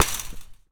Street Ball Fence Hit; SFX Kit

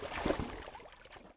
Underwater - Swimming; RadwinIsland

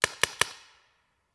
Paintball Gun; Sound Ideas; Guns

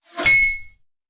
Bullet Impact; Sound Ideas; Guns

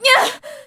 female pain hard; firefight

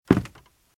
Footsteps - Wood - Creaky - Walk - Left

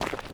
dirt; firefight

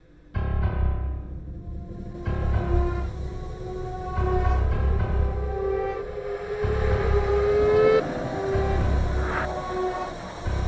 Strong Boss

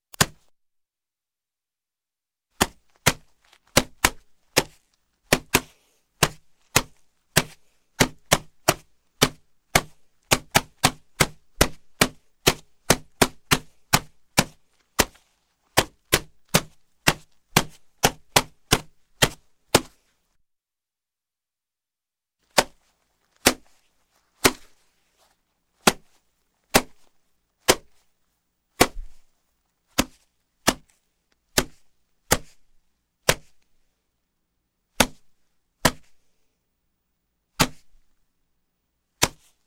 Punch, Boxing Single Punch With Boxing Glove; Exercise; Sound Ideas 2000 Series

